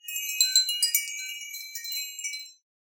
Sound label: Wind Chimes B